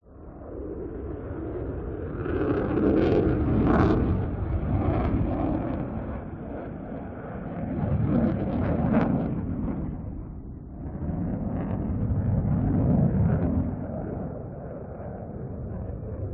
f 16 jet fighter passing by slowly in the distance; sound ex machina